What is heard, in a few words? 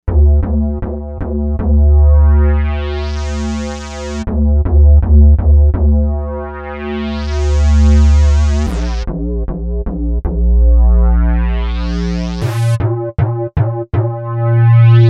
Cylon Bass; Novation UltraNova; Factory Patches